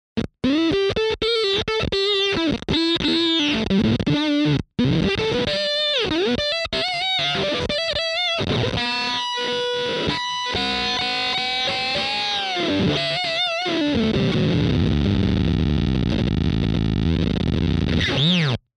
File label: DFST-1 Envelope Mode